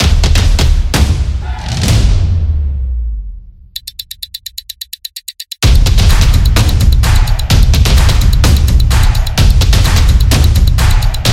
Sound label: Sport Action Beat; football promo